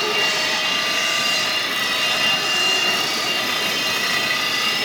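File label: Auto Wheel Scrape Concrete Loop; Automobiles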